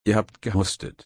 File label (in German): husten